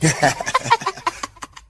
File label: laughter guy girl; ooh ahh